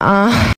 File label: female orgasm